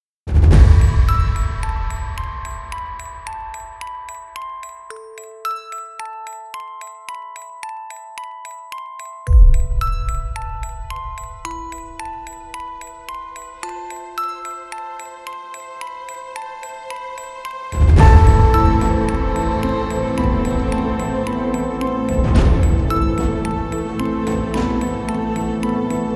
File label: scary horror suspense background music